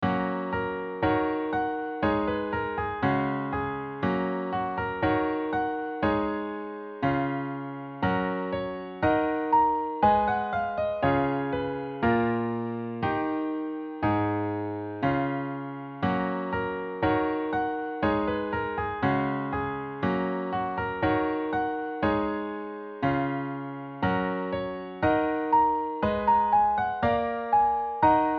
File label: chords f sharp major